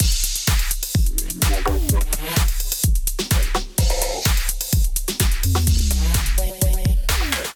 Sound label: mega vox kit groove 127 bpm